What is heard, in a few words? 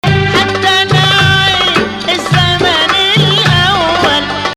Rast Ya Maal 2nd Rast